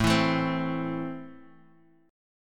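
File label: A Minor, chord strum; Am strummed; JGuitar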